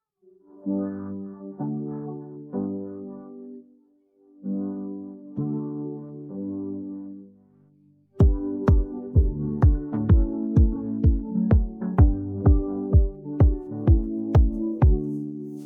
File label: Instr; Móm psa lapsa; piosenki dla dzieci